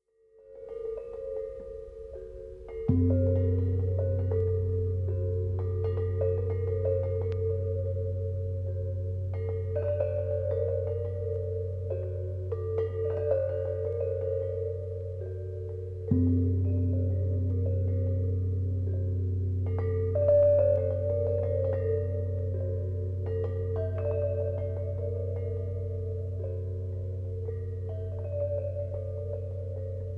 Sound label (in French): cloches; Kremski